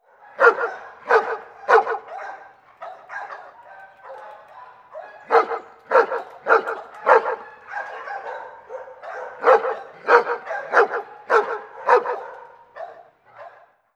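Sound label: animal dogs distant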